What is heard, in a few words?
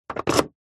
mains plug adapter australia to uk plug in; household